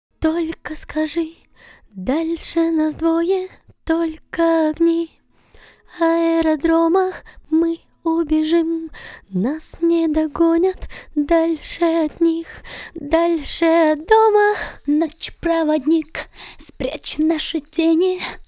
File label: VOX Couplet, acapellas